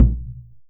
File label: CHANG GO, KOREAN DRUMS; AKAI S6000 CD-ROM; WorldSounds